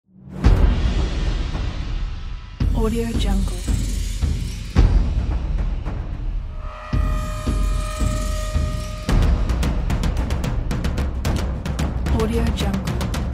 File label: drums tribal rite ritual